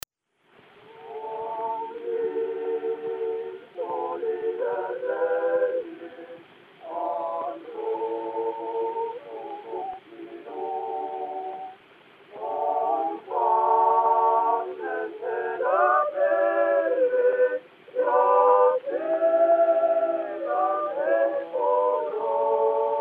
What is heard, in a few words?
Gramophone